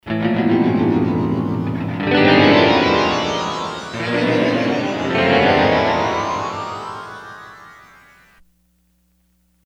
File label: Falling; Sony HR-GP5